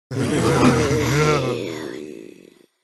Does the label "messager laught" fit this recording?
Yes